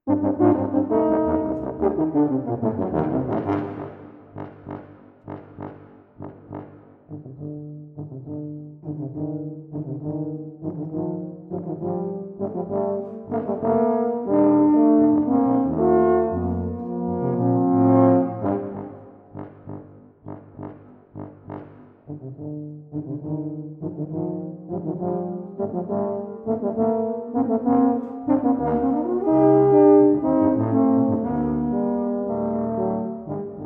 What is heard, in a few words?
Tuba Trio